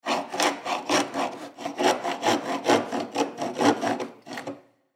Saw Drywall Cut; Saws; Machines; INDUSTRY

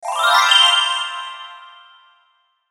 Pixie Dust Glockenspiel Ascend; Mallet; Blastwave

